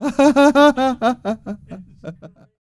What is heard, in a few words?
LAUGHS; Spectrasonics; Vocal Planet; Jazz FX